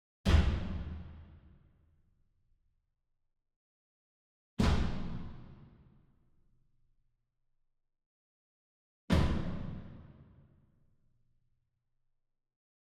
Foot Stomp Group Far; SWEETENER; boom library; Cinematic Strikes Construction Kit

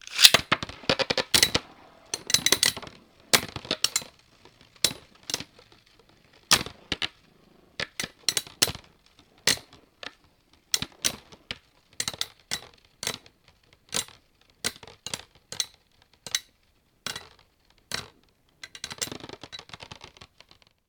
bayblade; devices accessories utilities; NS Studios - ultra sound collection

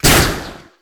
Gun Nail Rifle Shot; Weapons

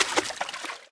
water; firefight

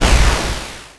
Menu Scifi

